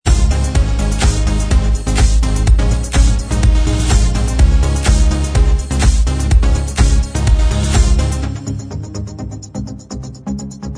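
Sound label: instrumental track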